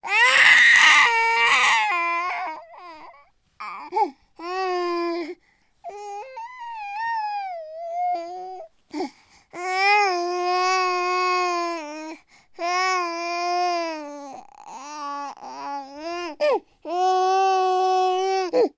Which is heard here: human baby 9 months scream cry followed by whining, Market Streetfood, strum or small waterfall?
human baby 9 months scream cry followed by whining